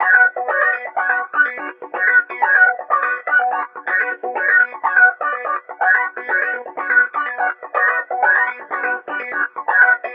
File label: Filtered Guitar; Sonic Foundry (Sony Creative Software); Club Joints and Street Anthems; Session 05 - Game Time